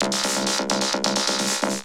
Vocobeat; Sonic Foundry (Sony Creative Software)

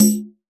Req Dum, Tambourines; Percussion

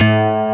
BELL BASS; East West; Global Sounds

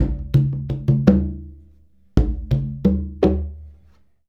Congas; Essential Percussion

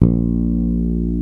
BASS A#2, ELEC BASS; East West - Global Sounds

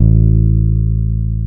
FEND P-BASS A1

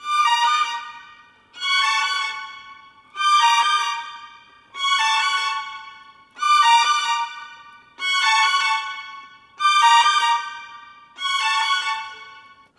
Violins, Cinematic; Sonic Foundry (Sony Creative Software); Orchestral Series VOL-3 - Cinematic

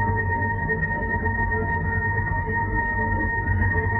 Tonal Re Draw, sparse soundscape

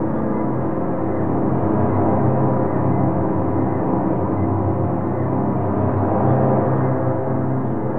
Drone Ambient; Drums & Drones

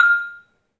MARIMBA; 3D Sound; 3D Keys VOL-1

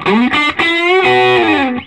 SOLO, Vintage Blues Guitar

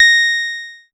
A#, PIANO; Big Fish Audio; Synclav FM

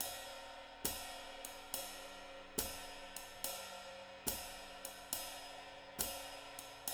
JZ70 SWING, Living Drums; Sampleheads; Peter Erskine

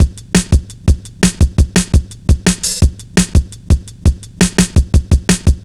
170, Drum Loops, Drum & Bass

